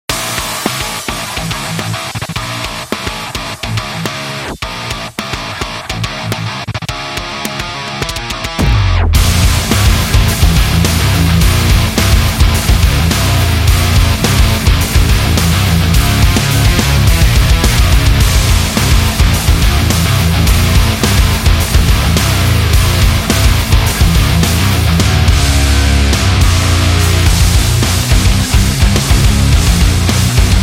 Metal Game

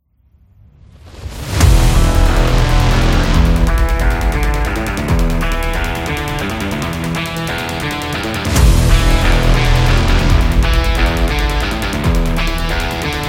Rock Epic Trailer

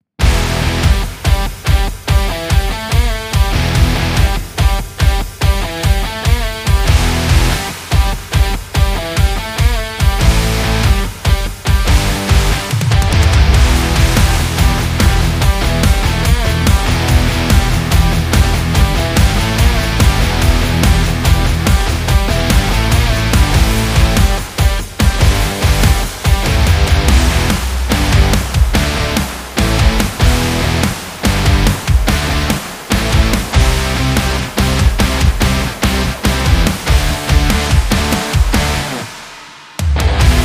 Rock Bar

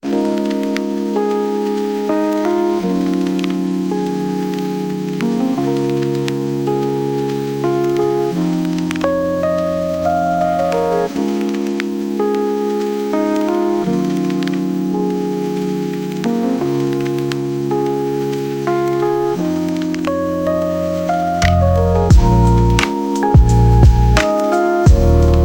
Smooth Vibes, lofi chill hop

